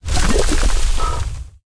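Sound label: projectile; Smite; Vulcan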